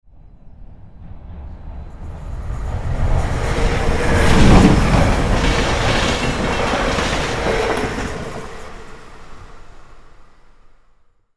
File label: Train approaching and passing by; vehicle